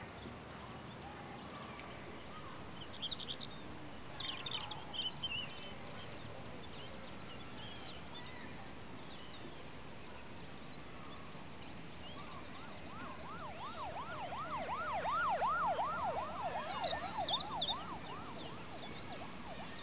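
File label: Ambience Basketball; Street Cricket Champions; PSP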